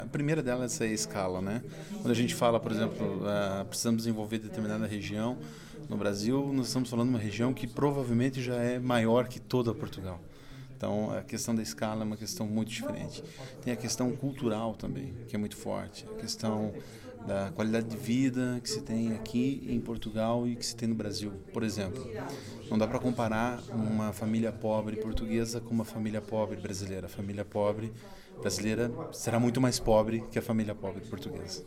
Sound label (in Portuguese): escala